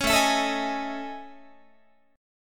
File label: A Major Sharp 11th, Guitar; AM#11 strummed